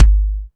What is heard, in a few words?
BD SOFT, Techno 138 BPM; Best Service ProSamples vol.54; AKAI